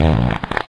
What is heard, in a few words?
amazing fart sound effect; prop hunt x originals